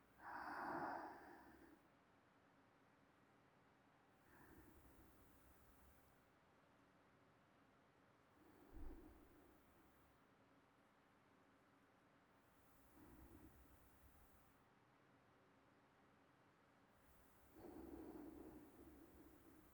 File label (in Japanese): 寝息ループ